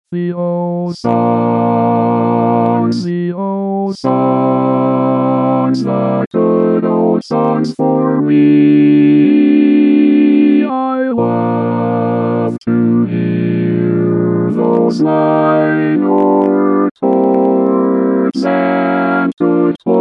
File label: oldsongs